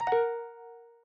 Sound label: New Mail; KDE; knotify